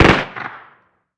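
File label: gun miniuzi medium; ModernWarfare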